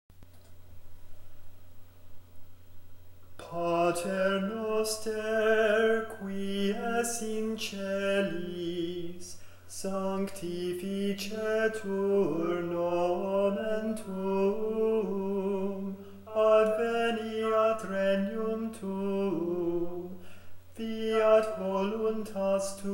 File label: gregorian chant